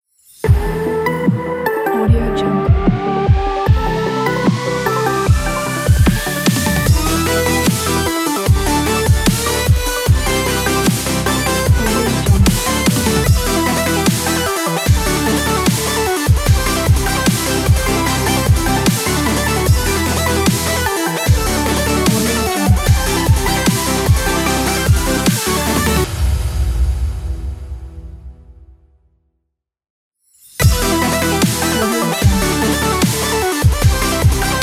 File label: dubstep intro logo